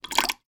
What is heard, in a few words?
household short dribble drip of water from tap into plastic washing up bowl